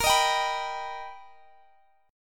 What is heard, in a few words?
B Augmented Major 7th A sharp, chord